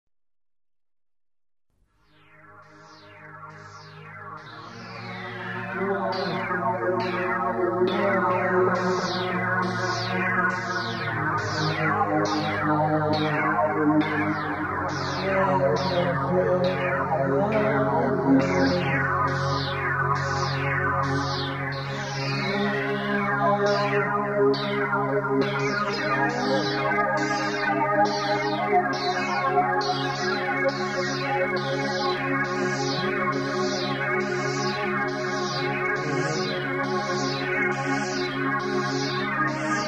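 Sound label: modular voice, Electronic music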